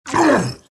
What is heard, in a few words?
morph pain; terrorblade